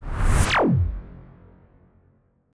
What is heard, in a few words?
Blaster